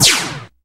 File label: shot laser